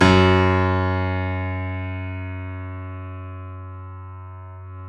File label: F#1, YC7 Piano mf